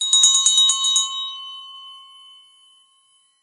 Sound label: buy; Quentin C's Gameroom